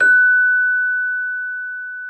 Celesta F#6; Xsample VOL-8

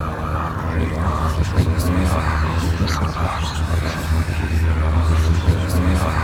MURMUR; MURMURING

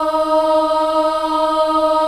D#3 CHILD AH R, Choir; Acoustic & Synth; CHILD AHP; AKAI; USB Soundscan vol.28

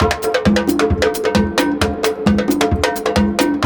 CONGA BEAT; Zero G - Ethnic

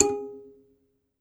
BASS KALI, African Melodic; E-MU Producer Series Vol. 7 – Old World Instruments

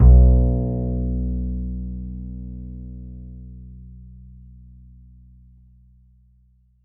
A, Upright Acoustic Bass; 1ST POSITION; Larry Seyer

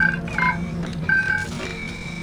COMPUTER; Best Service; Advanced Mega Effects

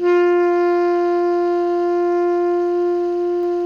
TENOR PP F4, Sax; Greytsounds; Philip Wolfe David Crozier's Saxophones; TENOR SWITCH

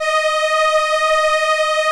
ARP STR ENSL, First Call Vintage Keyboards

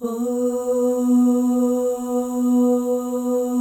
OH, FEM, Symphony of Voices; Spectrasonics

